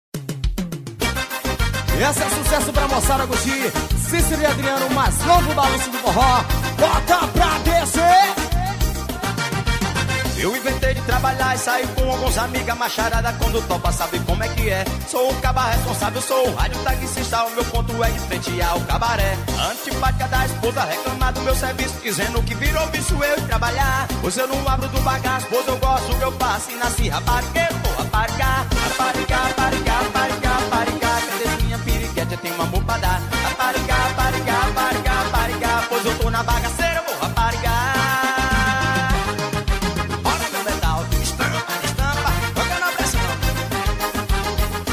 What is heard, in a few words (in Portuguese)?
forrozão